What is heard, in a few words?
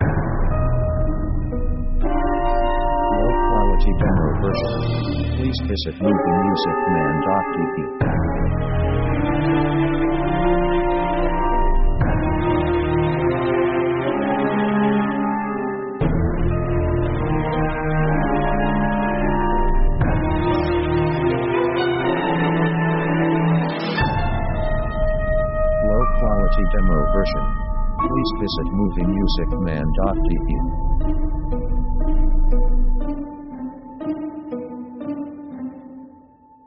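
orchestral stomping atonal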